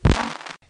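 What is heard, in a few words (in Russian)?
треск порванных штанов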